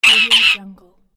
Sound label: cartoon bird scream